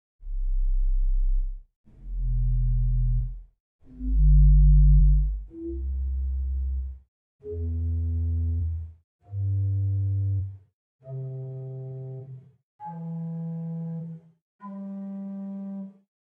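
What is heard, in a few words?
Pedal Subbass 16 arp, organ; Buzard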